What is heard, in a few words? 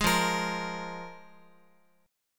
F Minor Double Flat 5th F Guitar; Fmbb5 strummed; JGuitar